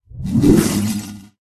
bug pet buff; pet petia

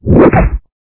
bear upstrike

